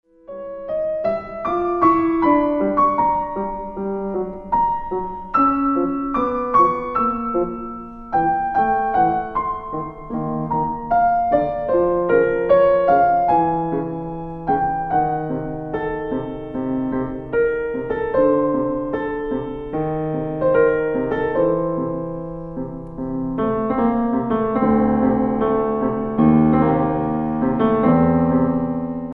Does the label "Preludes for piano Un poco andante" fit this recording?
Yes